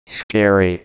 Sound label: scary synth